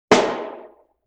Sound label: Drum Rim Shot Roomy; Vintage Cartoon; Drums & Percussion